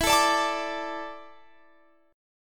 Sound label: A Suspended 4th E